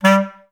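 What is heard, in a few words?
staccato G2; Best Service; Peter Siedlaczek's Advanced Orchestra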